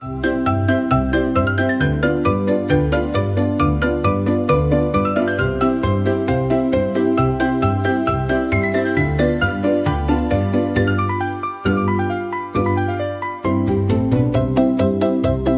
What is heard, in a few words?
playful